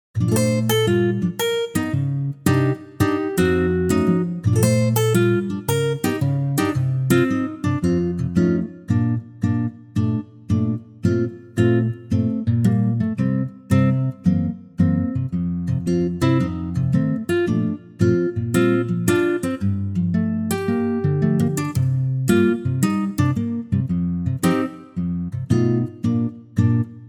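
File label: Bb acoustic guitar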